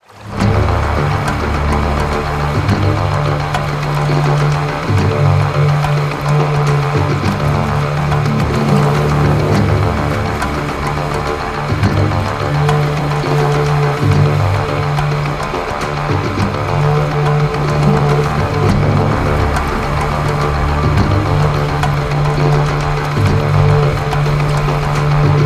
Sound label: Launch; Homeplanet Gold